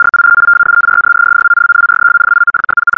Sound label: 50s space telemetry; Electro and Synthetic